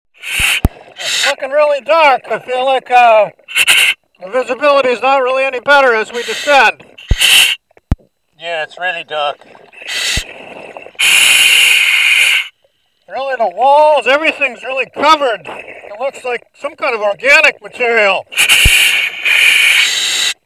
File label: Cave dive